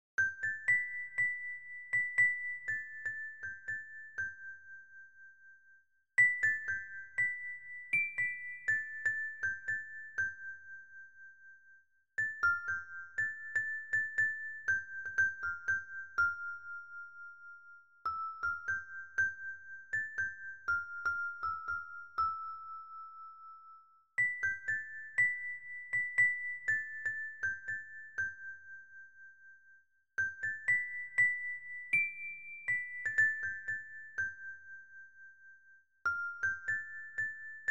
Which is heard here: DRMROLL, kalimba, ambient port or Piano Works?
kalimba